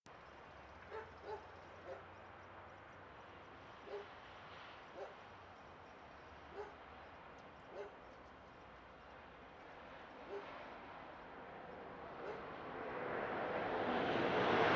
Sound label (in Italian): Treno mono; Eigenmike; A-weighted; Nuova Strada